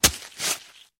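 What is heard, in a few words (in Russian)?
проникающий удар мечом в мякоть фрукта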